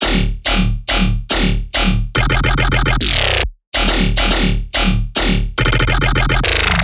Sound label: Riddim Bass Loop E Drill 140; Ghosthack; Riddim Essentials 2020